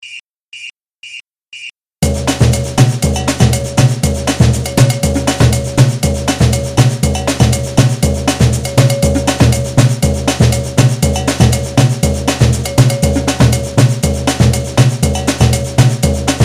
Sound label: Ragga Groove